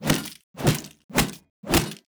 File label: Hammer 1H